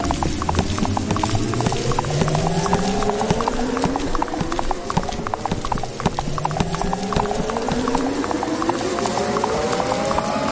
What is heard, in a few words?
near miss; Racetrack Riches; megaboard